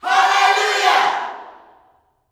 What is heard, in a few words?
"Allelujah", Vocal Gospel yell shout; Spectrasonics; Vocal Planet Vol.1 Gospel; akai